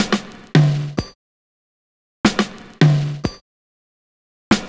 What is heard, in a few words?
INTRO FILL, LOOP; Univers Sons - Kitch 70s